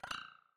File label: graphics robotic tick; soundscrate